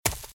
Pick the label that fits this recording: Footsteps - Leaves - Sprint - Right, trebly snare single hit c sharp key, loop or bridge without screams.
Footsteps - Leaves - Sprint - Right